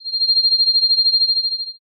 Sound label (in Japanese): shiin